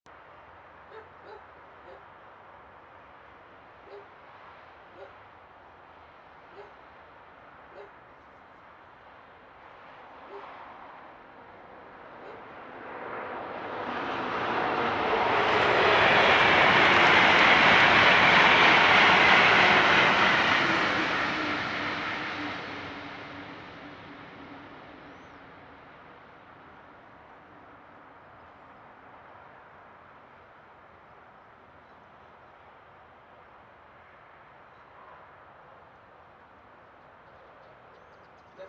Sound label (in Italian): Treno mono; Eigenmike; A-weighted; Nuova Strada